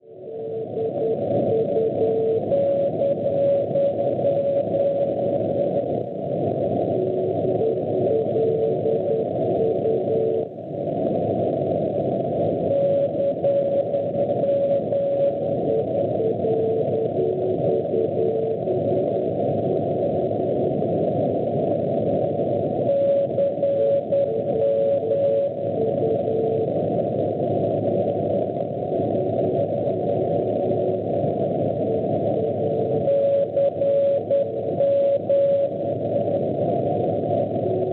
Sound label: NDB Luxembourg Berg 368.5 kHz; ELU; Lussemburgo; Schwarzsee